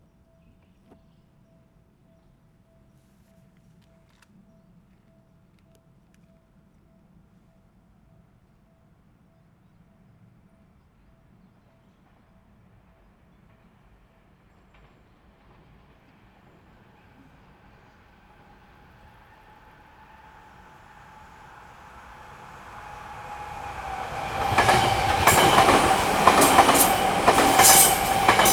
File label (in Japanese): 上り電車通過。; H2essential MS内蔵マイク指向性90°＋ZOOM ヘアリーウィンドスクリーン WSH-2e; 録音したファイルを、本体でノーマライズしました。